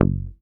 HOFNER MUTE, Bass

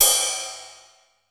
XE8 RIDE, drum; Crypton - DrumBase5000

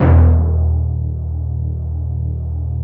timpani, Yamaha TG-500

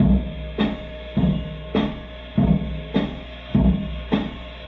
Loop, rhythmate, classic machines

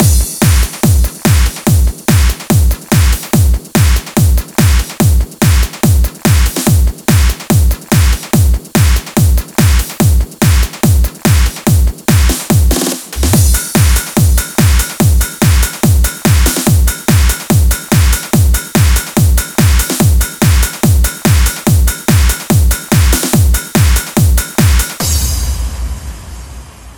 144 BPM Drum Mix 16 bars; Vengeance - Essential Clubsounds Vol.5; Flight Kit Root Amin; Drums & Perc

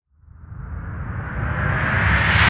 FX, retro house

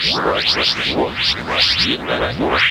FX, Drum & Bass

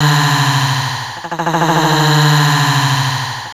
Female Stretch Vocals; Houseworx

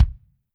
KICK, Drums Percussion; Kick 1 ModuIe

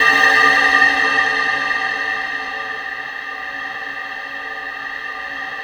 STEEL SPIR, ODD BELLS; Spectrasonic Distorted Reality 1

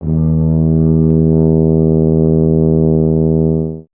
BRS TUBA, Solo Instruments

